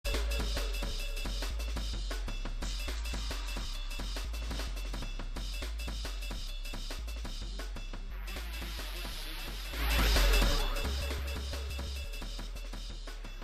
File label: Drum and Bass Jungle, UK Bass Radio; The Rupture Sessions LIVE